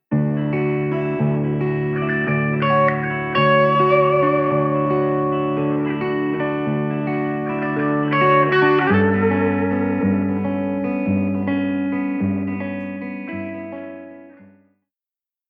Guitar Vintage Spring Binaural; Blackhole Immersive

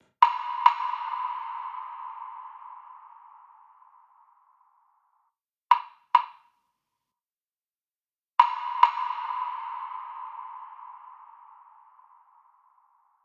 Wood Block Ecto Sauna Binaural; Blackhole Immersive

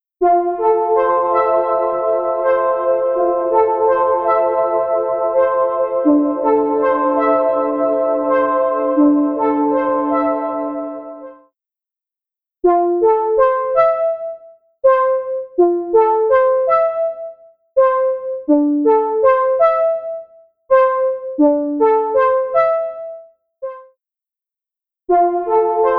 Synth Arp; Tverb; Stuck in the Silo

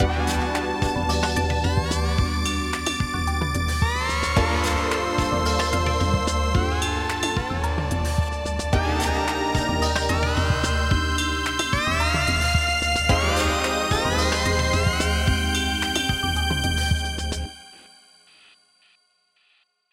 bend multinote randomize

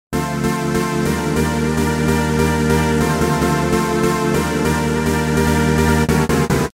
synth main; バッキングのシンセは2つ; メインの質感にサブのアタックを足している感じで、双方大変良い音でとても気に入っております。; Synth Sounds1; Big Modular Patching

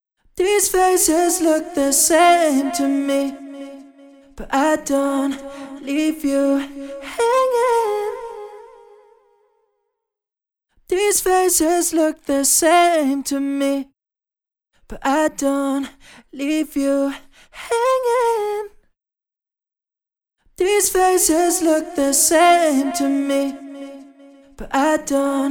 Vocals GIGUNDO CHORUS; H3000 Factory Mk II; Preset: Gigundo Chorus

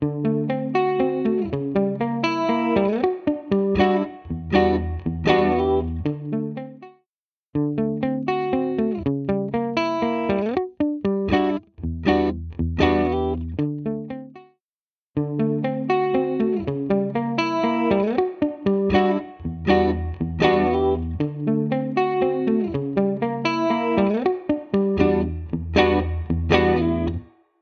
Reverb x MicroPitch; Preset: Reverb X MicroPitch; Physion Mk II

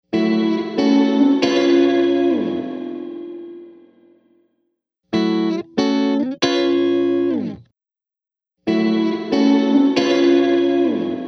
Guitar, Electric Guitar; H3000 Factory Mk II; Preset: Big Reflector

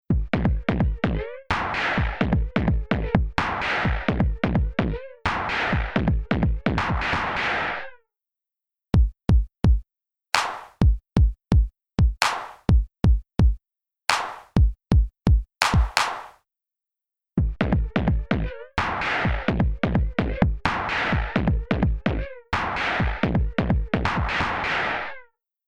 Drum Machine; H9 Bundle; Plug-ins: MangledVerb (Zinger) + CrushStation (Bisque)

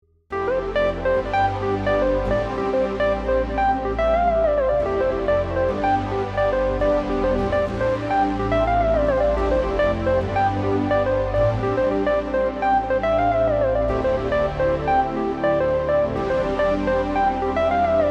Saw Solo; dexibell vivo s2

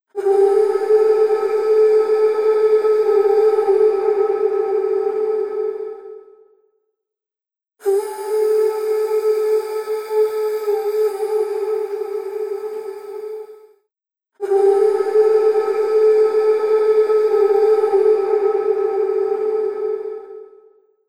Vocals Eerie Cavern; Preset: Eerie Cavern

